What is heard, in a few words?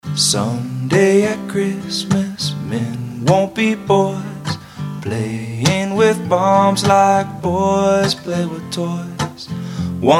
holiday acoustic